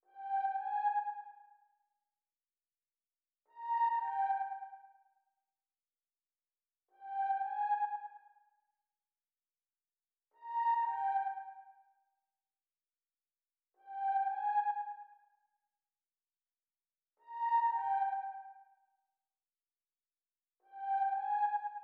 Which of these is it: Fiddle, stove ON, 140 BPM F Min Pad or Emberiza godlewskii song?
140 BPM F Min Pad